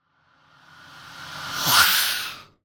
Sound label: Ghost Whoosh Female; Fox Audio Post-Production; Ghost Whoosh – Male&Female